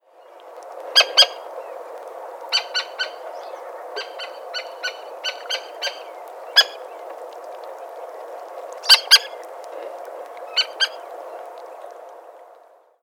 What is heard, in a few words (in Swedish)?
Antarktsik tärna